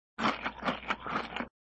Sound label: Sound Chewing